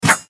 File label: Jump; Chili